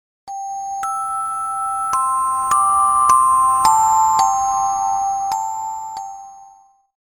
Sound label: xmas melody